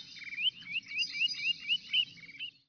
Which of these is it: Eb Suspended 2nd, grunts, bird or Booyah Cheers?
bird